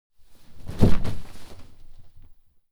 clothes low hit drop; foley; smartsoundfx

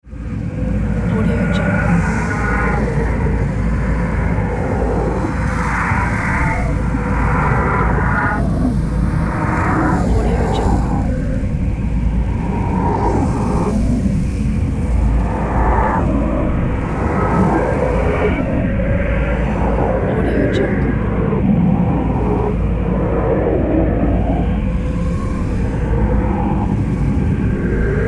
spooky demonic ambience

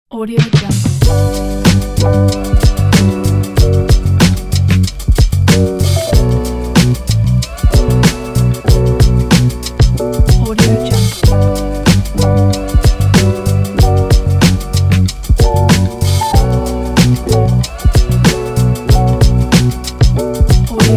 a hip hop

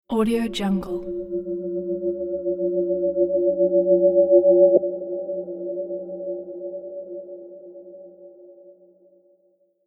resonating magic spell; sonic-boom

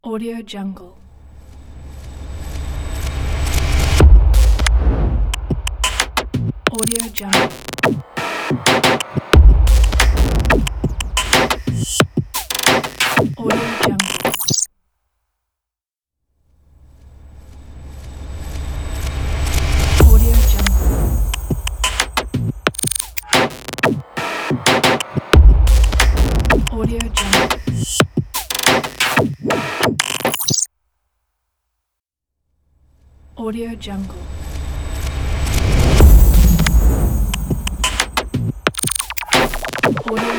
digital glitch futuristic logo

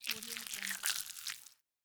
flesh movement

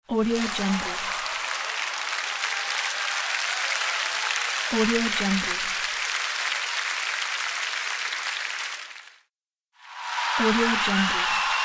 applauding

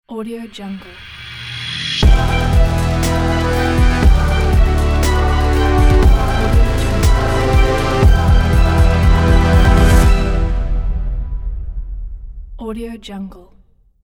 epic logo

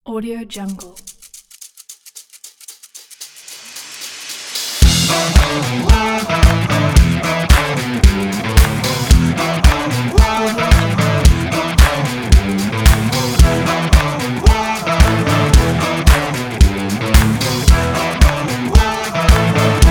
energetic upbeat indie rock

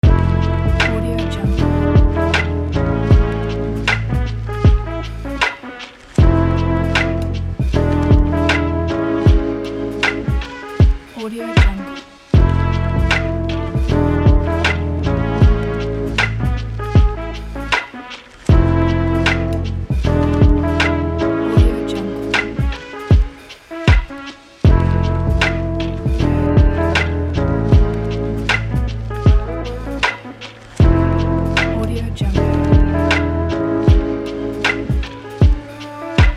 lazy lofi beat loop; hotloops

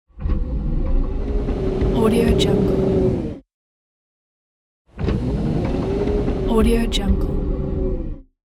spaceship hatch open close

